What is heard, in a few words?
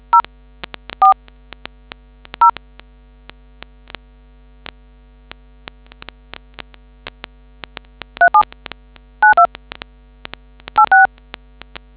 dtmf